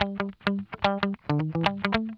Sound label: HARD PICK, Funk Guitar; 90 s Sample CDs; Zero G